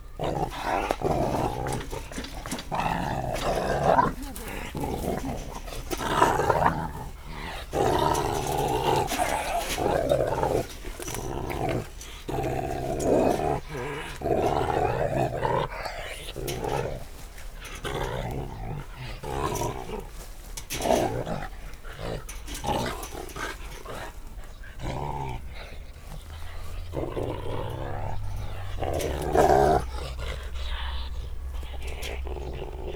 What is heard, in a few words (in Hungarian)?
merges, aranysakál; szegedi zoo 2011